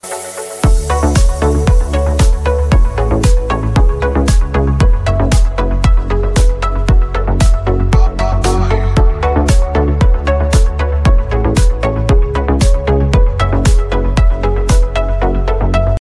electro

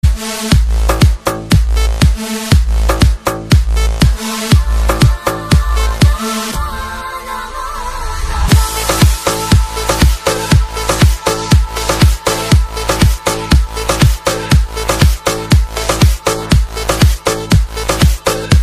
arabic

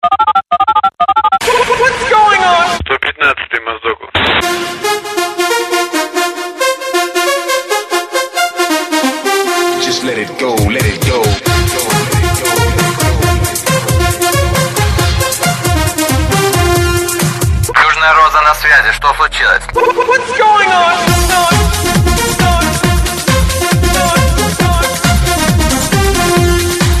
silk notification

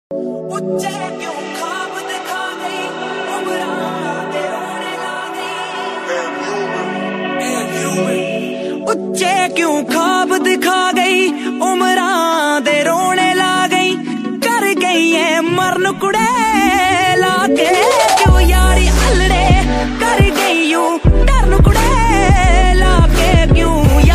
punjabi

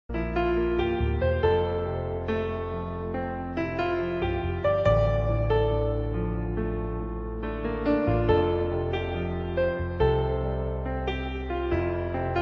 piano sad

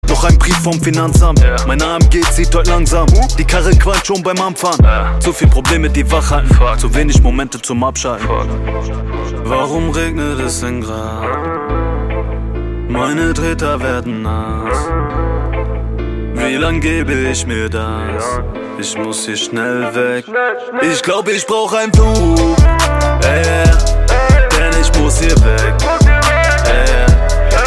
Dutch ringtone

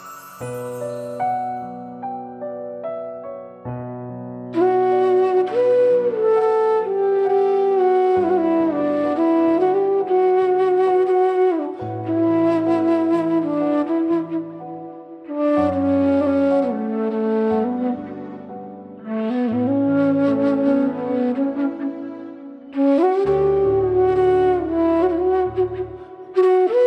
slow music

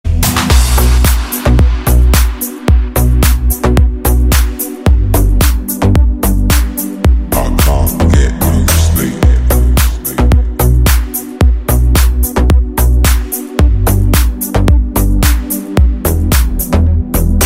samsung s9 mix, ringtone

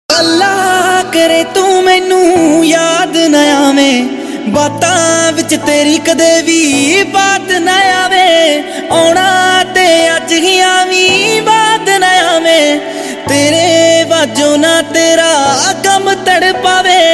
punjabi sad, ringtone

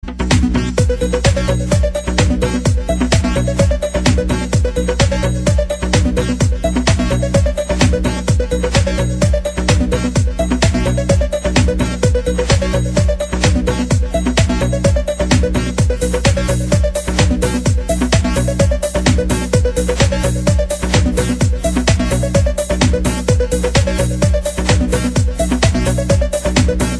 progressive trap

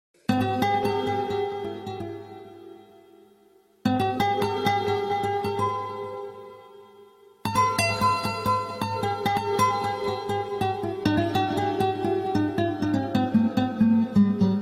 cool guitar

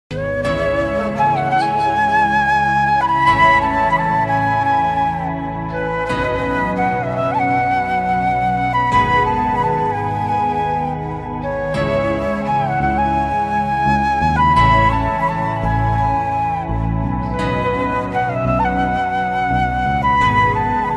bangla emotional

